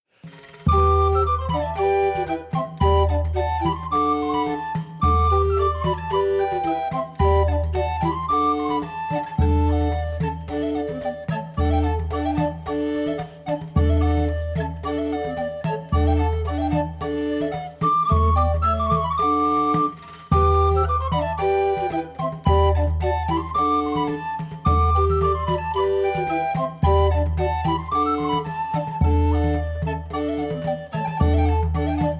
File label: Bransle de la Royne; M Praetorius